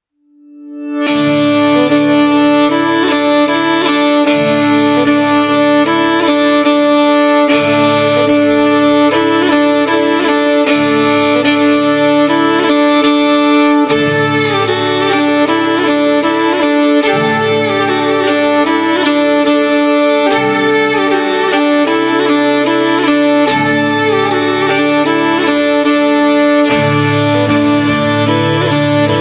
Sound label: celtic violin song